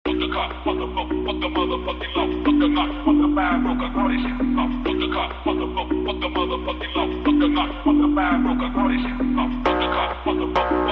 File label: Phonk; ringtone